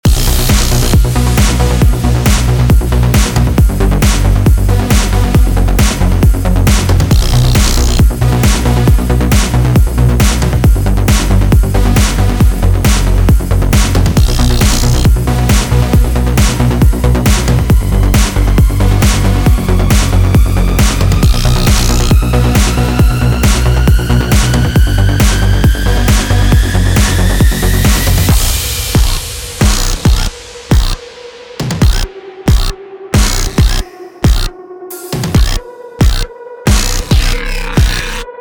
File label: Spider Boss Fight 136bpm; wildcat gun machine ps4 switch windows xbox one gamerip 2022